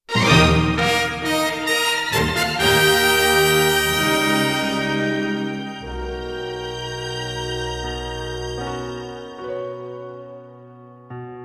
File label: overture; Super Mario Galaxy